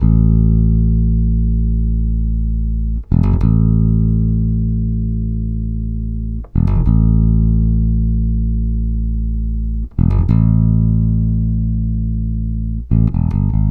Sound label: Country Bass, Intro; Digidesign; Pro Tools Essential Loops